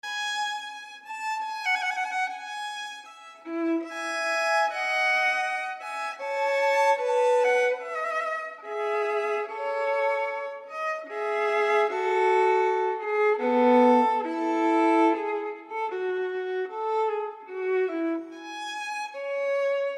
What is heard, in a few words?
Violin Partita in E Major, Loure; No. 3; BWV 1006